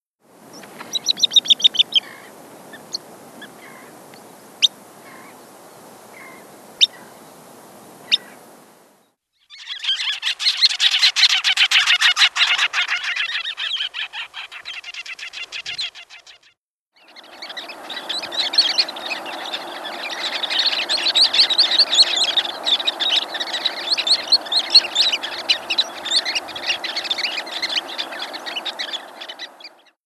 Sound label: Sanderling